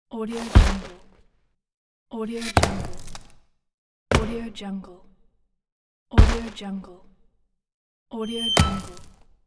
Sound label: fall impacts